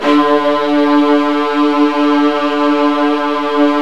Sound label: Virtuoso Strings